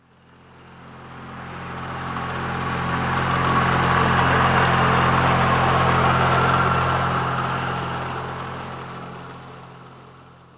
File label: mower